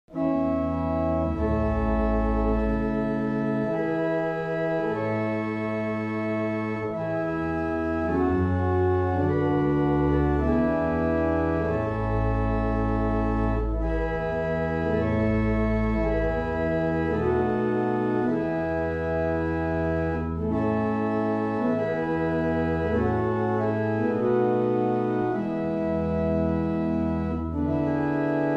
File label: Hanover, barrel organs; Meldreth